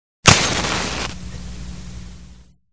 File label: match liting; SOUND EFFECTS1